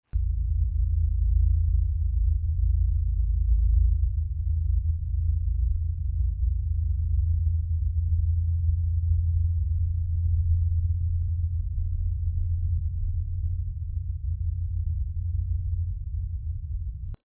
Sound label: Hum Recording